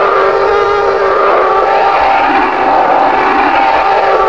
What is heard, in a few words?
tie engine whine